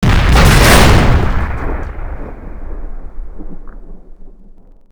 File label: big bang; WAR